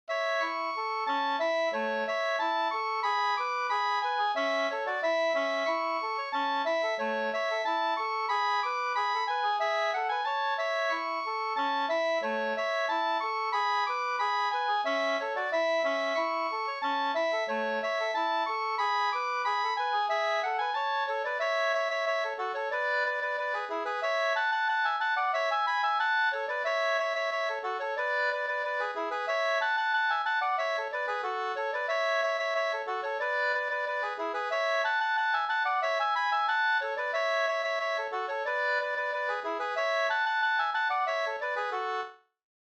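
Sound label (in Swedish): anglas 2 stämmor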